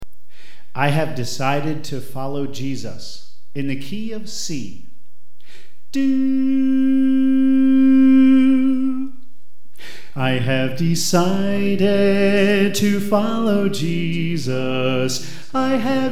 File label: Soprano; I Have Decided To Follow Jesus